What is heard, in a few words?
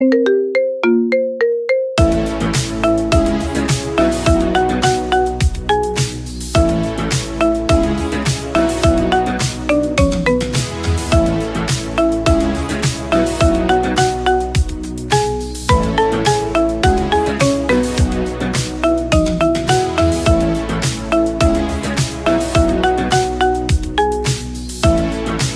Marimba Remix